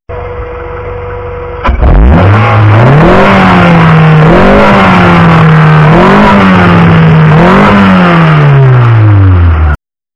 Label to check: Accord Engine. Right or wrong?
Right